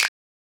Snap

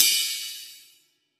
Ride; FLPRO

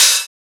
Open Hat; FLPRO

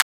Transient; FLPRO

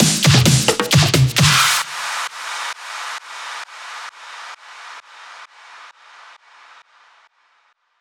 EDM Drum Fill; FL PRO

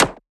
Perc, Percussion; FLPRO

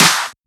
Clap; FLPRO

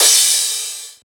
Crash

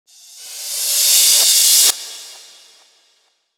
ReCrash; FLPRO

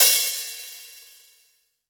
Ride

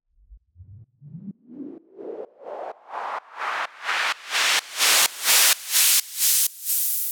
Raise SideChained

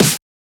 Snare; FLPRO; Snares

